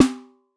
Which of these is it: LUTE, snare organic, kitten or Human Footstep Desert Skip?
snare organic